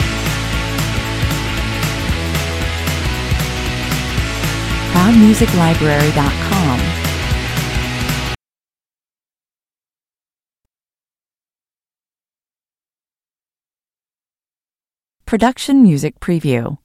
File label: rock me indie (loop)